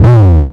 Slide E, Basses; Total Drum&Bass

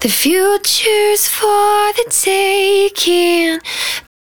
110bpm Ab, Velvet Vocal; Zero-G

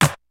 kicks snare, kid; Kid Nepro; Elite; KICKS SNARES

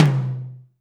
Tom Hi, Alesis DM5; Drums

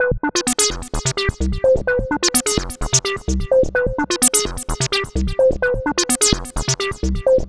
Lead

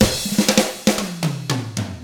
ROCK

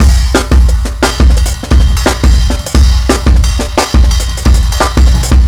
Jungle 4 Bar, Drum n Bass Beats; KJ Sawka - Drum n Bass & Beyond; Beats and Percussion

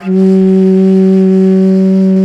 BASS BANS, Bansuris; Winds; E-MU Producer Series Vol. 7 – Old World Instruments

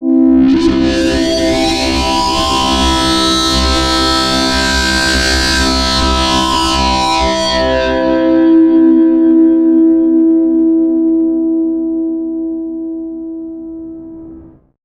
Neon One Note; AMG - Prototype Drum & Bass

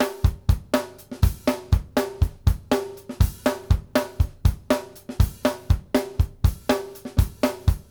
BASIC D L, Drumworks

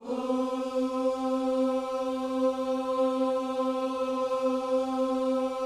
OOS C, Vocal Gospel

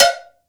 COWBELL

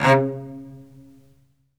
SOLO CE, Strings; Synclavier; ILIO

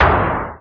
noise fx; Masterbits; Switch FX

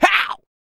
YELL, MALE HUMAN; ILIO; Vocal Planet

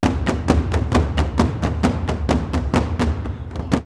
drumloops World Ethnic, 128; Dar es Saalam; X-Static Goldmine

